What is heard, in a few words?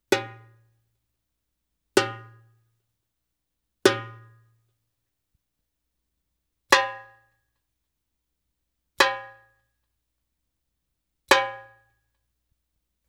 Arabic Rhythms